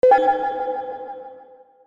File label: game lost